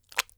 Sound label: clay; voxelverse